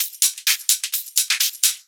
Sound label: Hihat Loop 128 BPM